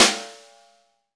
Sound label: snare, Roland; Club-50 - Foundations Roland; Ext. Snare 4